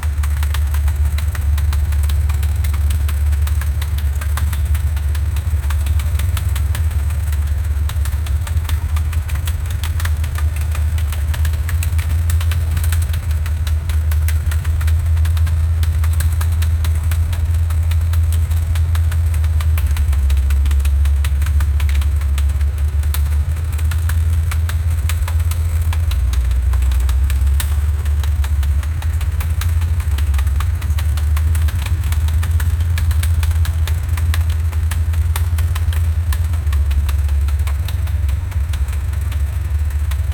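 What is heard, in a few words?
running in school hallways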